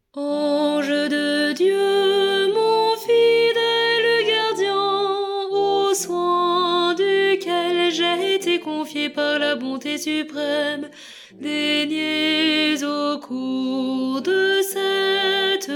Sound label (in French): priere a lange gardien soprane